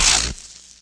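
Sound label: lightning gun hit; gusanos promode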